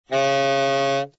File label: D sharp 4, tenor saxophone